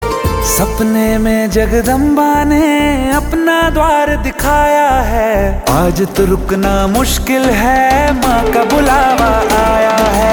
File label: Ringtone